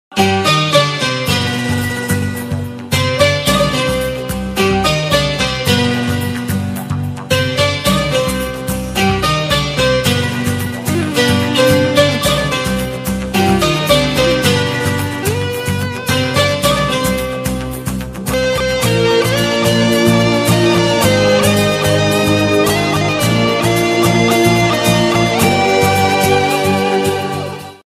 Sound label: ringtone music bgm bollywood instrumental ringtone ringtone trending song